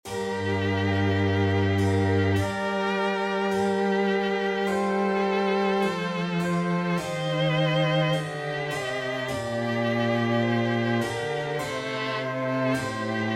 Trio Sonata g minor Grave, Alto Recorder, Violin and Continuo